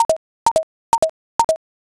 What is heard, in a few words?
geta